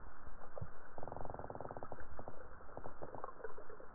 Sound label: purring, fish sound; tivoli bay